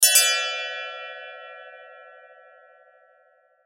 Doorbell Ring; Doorbell Chime; Blastwave

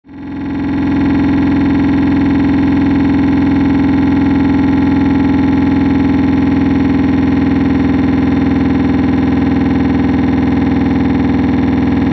Drone Hard Buzz Mechanical Descend; Blastwave

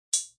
Ting, Metallic; Electronic

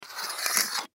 Key Slide Scrape; Foley; Blastwave

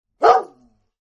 Dog Barks; Dogs; Sound Effects:Animals

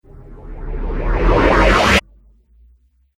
Riser Abrupt Stop Phaser; Rise And Dive Sound Effects

